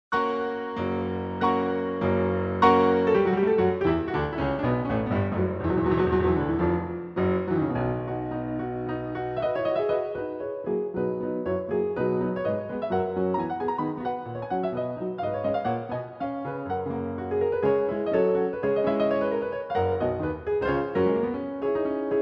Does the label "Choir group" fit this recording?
No